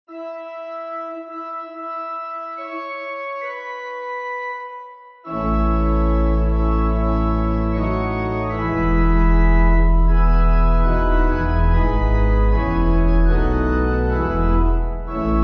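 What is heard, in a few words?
Pipe, Pipe Organ backing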